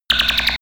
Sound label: laser machinegun; krieg-waffen; soundpool